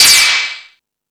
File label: Rap Session, E-MU Pro-Cussion